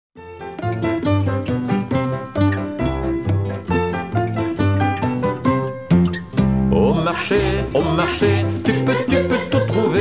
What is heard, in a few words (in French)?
chante Au marche chanson pour enfants